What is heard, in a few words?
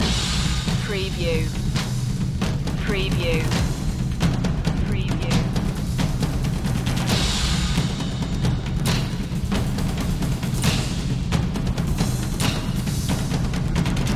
tribal march percussion loop